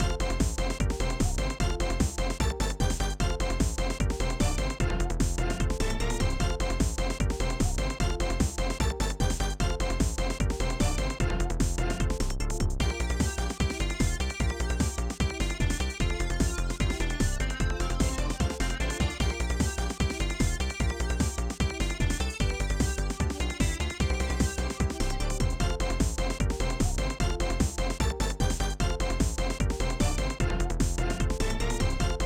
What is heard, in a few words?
Techno; PS1